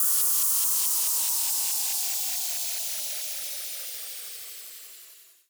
downhill fx

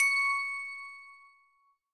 bell

